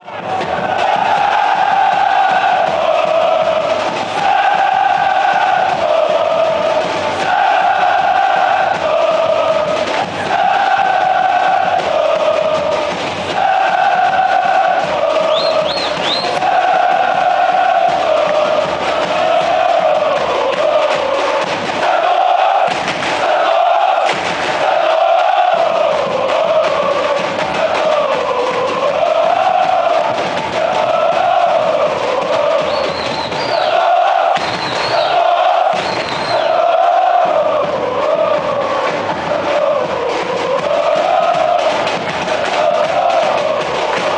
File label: saaaantoooooss saaaantooosss, fanchants